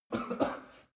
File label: male cough; soundpool